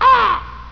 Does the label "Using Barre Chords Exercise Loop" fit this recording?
No